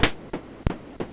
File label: Percussion Track, MOS6581R4